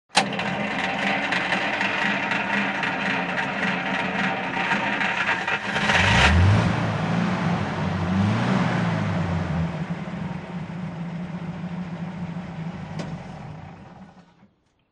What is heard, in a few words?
catch; WMBonusXP